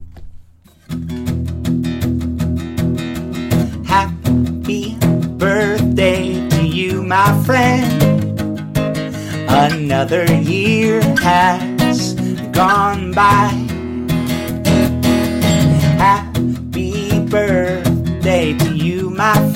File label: Happy Birthday in English Guitar